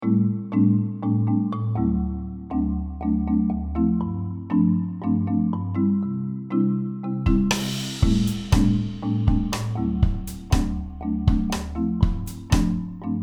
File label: percussion